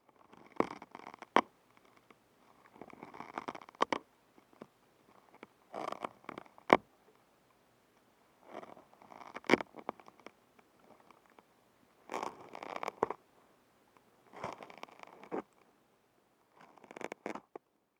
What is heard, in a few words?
dendenmushi